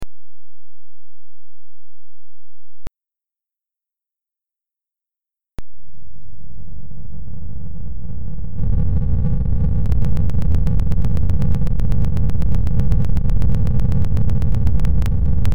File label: soft noise